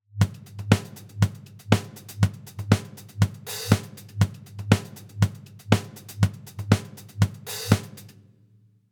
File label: drums linear phase